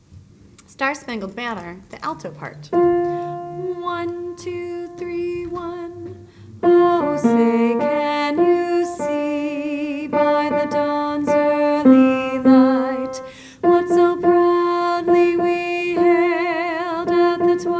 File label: Alto SSB with voice